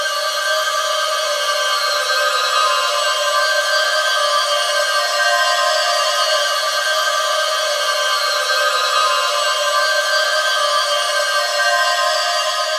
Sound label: Pad G Organic; Trap and Dubstep